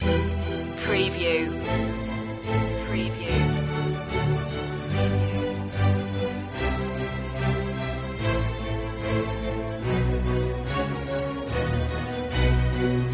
diamonds full orchestra

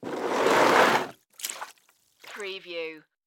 Bucket Slide Water; sound-effects-bible

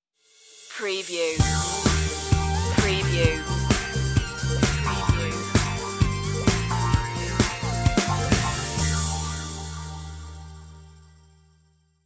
boogie disco stinger

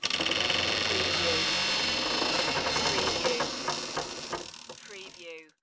Creak Long

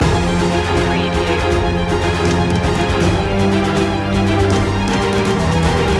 land loop

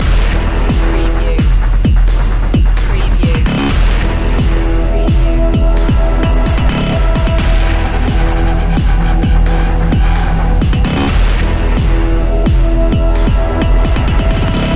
trailer madness loop

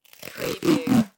Duct Tape Peel; Sound Effects Bible

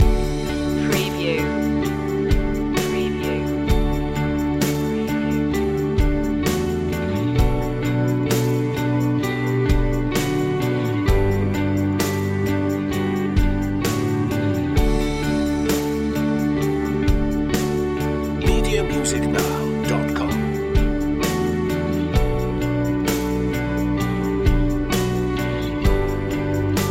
drifting loop

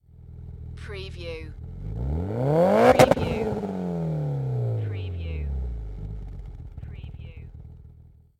MITSUBISHI EVOLOUTION EXHAUST SINGLE REV

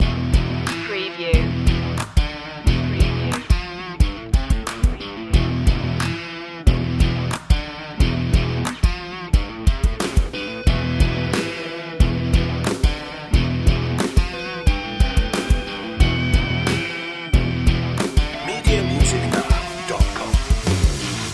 biker babe loop

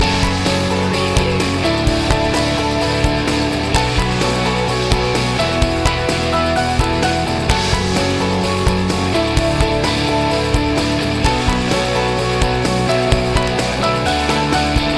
sidewalk rock loop

